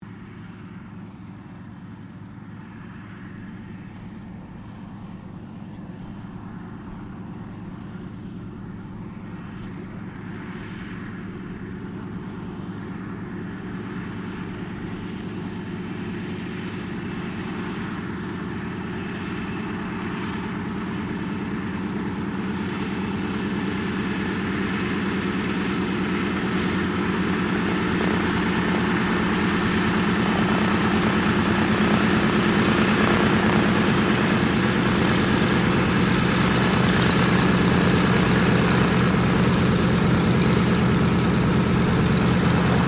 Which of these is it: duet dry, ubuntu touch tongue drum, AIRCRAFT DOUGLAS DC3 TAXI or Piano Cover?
AIRCRAFT DOUGLAS DC3 TAXI